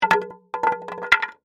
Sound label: Wood Plank Drop, 2X4; Sound Effects:Materials; BlastWave